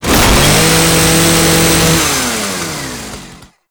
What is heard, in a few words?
Ghoul Saw Melee Swing Heavy; weapons; Warframe; Grn Ghoul Saw Player; Kuva Ghoul Saw